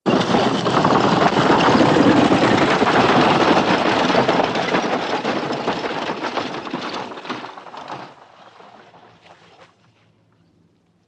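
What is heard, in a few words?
big wooden cart rolls down hill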